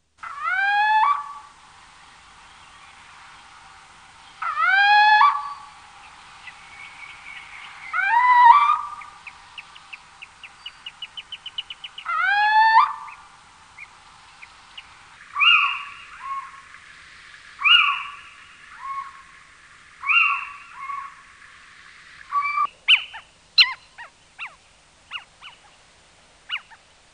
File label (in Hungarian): Kuvik; Madarak